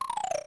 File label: dry bones; smas-smb3